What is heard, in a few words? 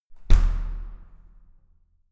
entrance door close extremely hard